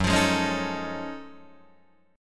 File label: F sharp Minor Major 13th, Guitar DADGAD; chordshape; down